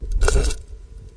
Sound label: Electro FX; Ueb HE Electro FX